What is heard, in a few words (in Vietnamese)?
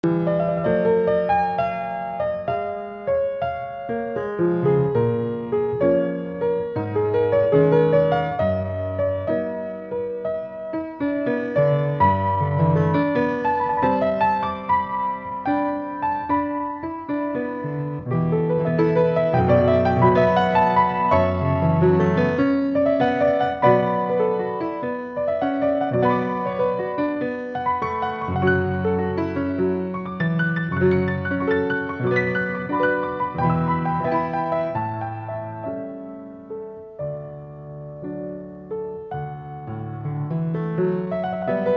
nhac khong loi viet nam; VOV3